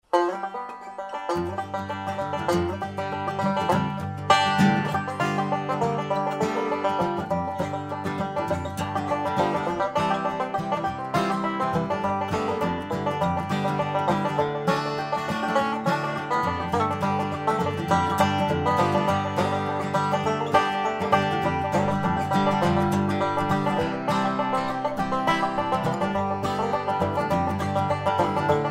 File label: blues